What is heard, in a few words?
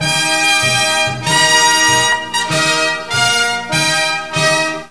Olympics Tune